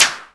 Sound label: WOP WIPE; EMU Orbit-3